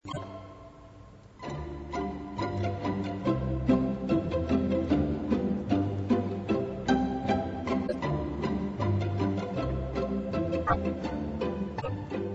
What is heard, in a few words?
pizzicato polka